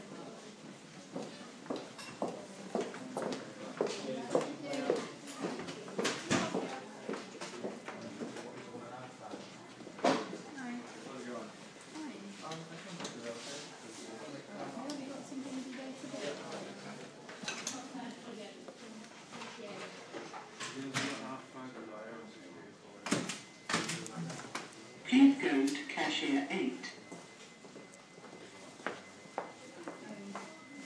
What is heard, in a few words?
leeds train station travel centre ambience